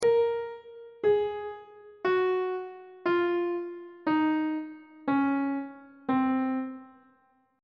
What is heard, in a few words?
c locrian mode descending on treble clef